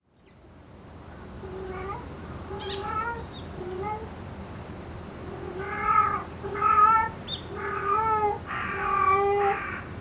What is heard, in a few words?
sakari cat